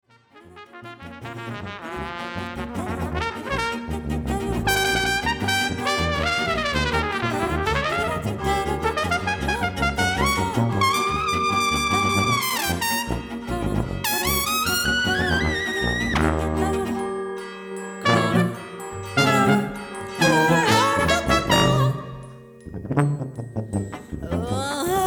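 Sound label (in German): gelaeut koeln, Geläut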